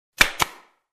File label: Stapler Construction